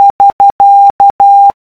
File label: Morse Prosign End of Work